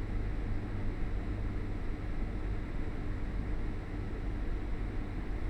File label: hvac, Train; LT1996; OpenBVE